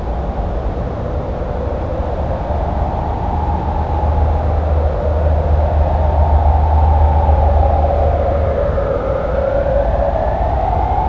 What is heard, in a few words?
city amb; grimthane